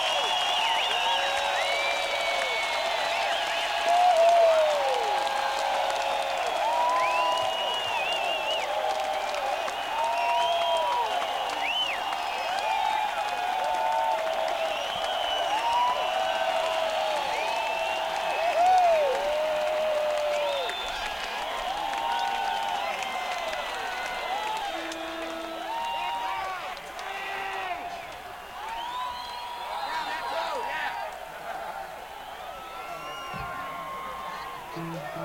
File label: Encore Break